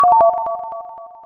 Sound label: weird route jingle